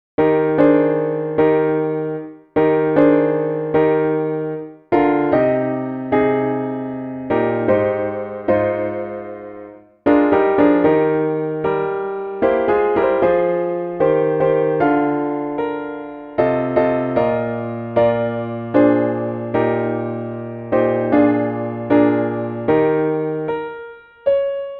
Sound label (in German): Alle Stimmen Klavier; As torrents in summer; Edward Elgar